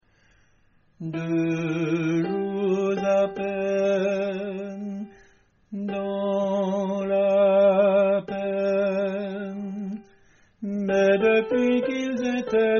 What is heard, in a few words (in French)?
basse